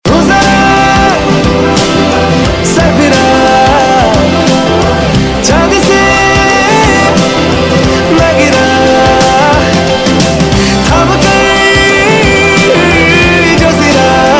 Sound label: Bollywood Song Ringtone